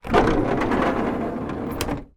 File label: transport renault master camper van 1982 door slide open